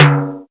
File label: timbales